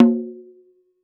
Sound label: PAIGU MIDF, PERCS-KIT; INIS - Opium